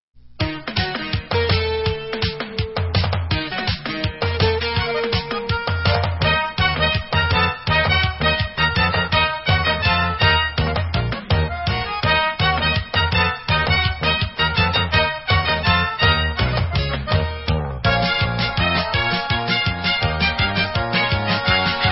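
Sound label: Hora Instrumental, Israeli Dances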